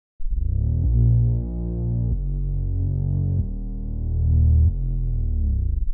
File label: Reese Bass 94 Am